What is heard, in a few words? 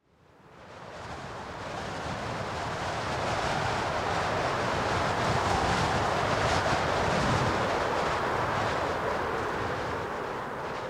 wind gust strong